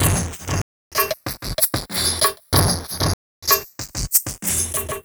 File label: Street Tip, R&B Drums